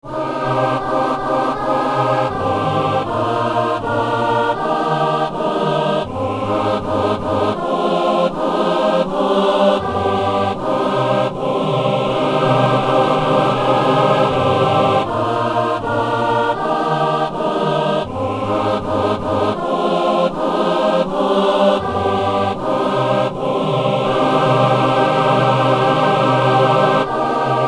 hymne

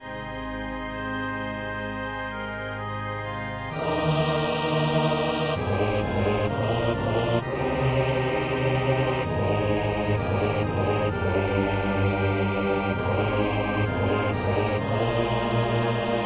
basse

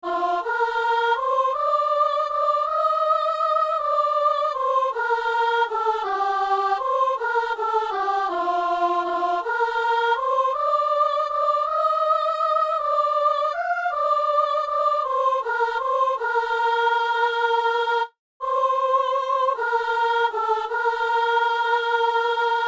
venez divin messie, soprano; Messe du 17 janvier à Saint-Denis

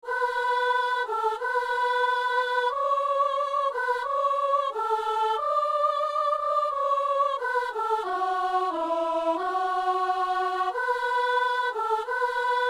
gloire a dieu paix aux hommes, soprano

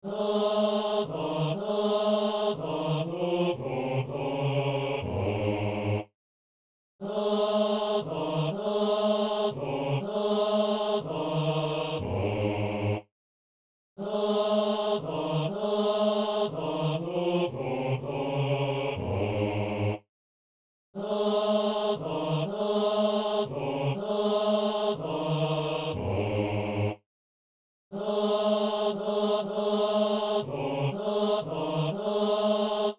psaume, basse

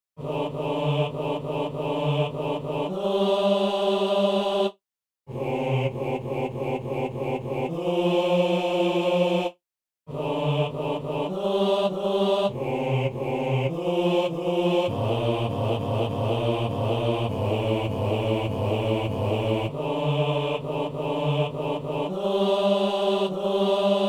basse

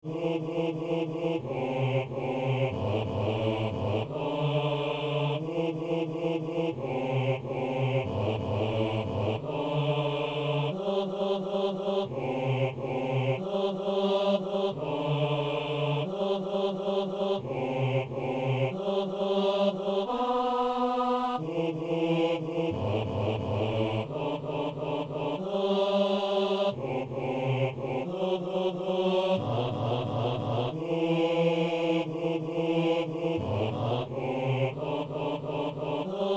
basse

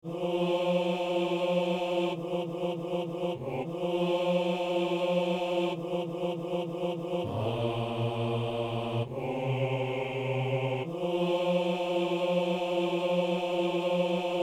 hommes; O Seigneur, en ce jour

